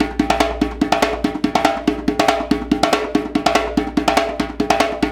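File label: percussion hand, percussion loop; Roland LCDP14 Africa VOL-2; BTS Af.Prc.Loops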